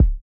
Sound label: Kick